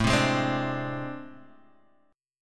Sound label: A Minor Major 7th Double Flat 5th A Guitar Standard, chord; AmM7bb5 strummed; 5,3,6,5,3,x